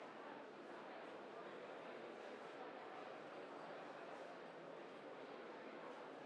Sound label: Crowd; blue archive